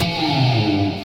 Karaoke transition Guitar; gamerip

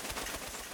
Ceiling Dropper Fly Loop; gamerip

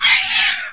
Scolar Attack

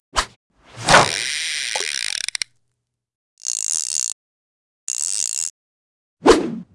Fishing Heavy; MiniGames

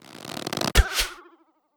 Attack Scout; Legend of Keepers (gamerip) (2019)

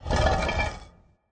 Rockslide

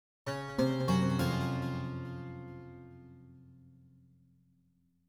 Strings; Cairo

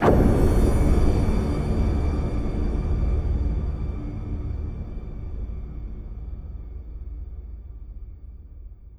Monster Altar

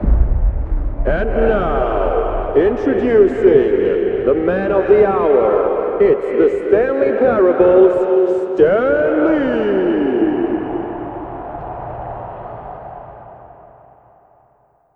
Crowd Ambience; Elevator Ending

